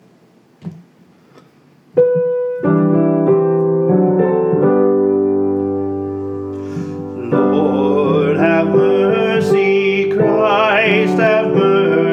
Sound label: kyrie english